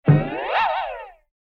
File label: toy rocking